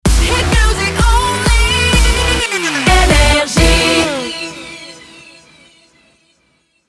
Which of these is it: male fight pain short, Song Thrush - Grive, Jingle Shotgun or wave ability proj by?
Jingle Shotgun